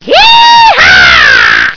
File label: yeehaaa